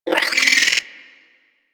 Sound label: Hyena Micro Vocal Angry; Warframe